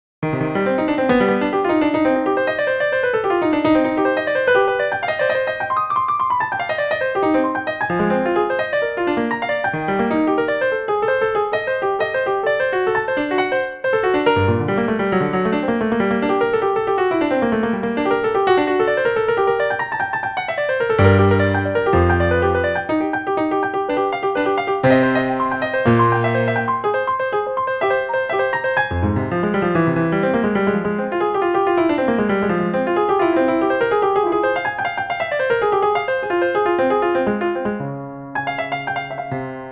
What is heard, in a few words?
solfeggio, piano